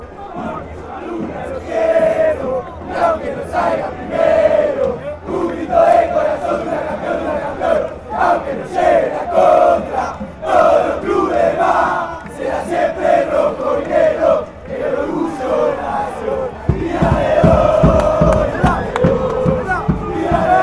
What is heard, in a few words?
douglas haig chant